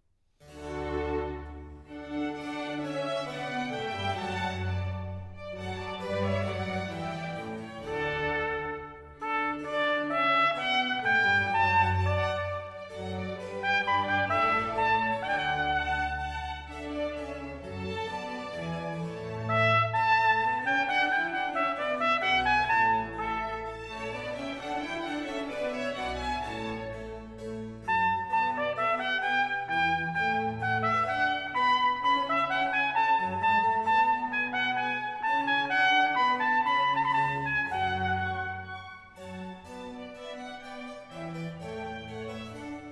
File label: baroque duet